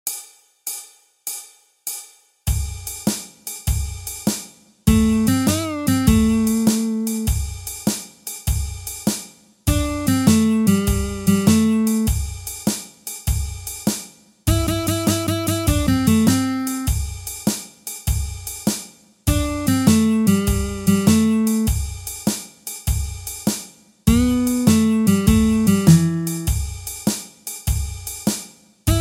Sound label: Blues Guitar